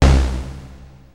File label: Access Virus B; Synthworx